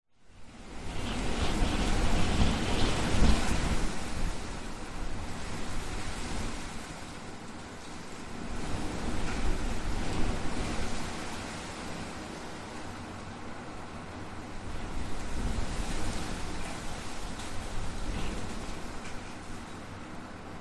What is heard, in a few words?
nature wind blustery outside open window palm trees narrow a jar air con; zapsplat